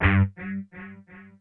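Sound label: mute distortion guitar g3; PC Gamer IT CD 40; SMACDEMO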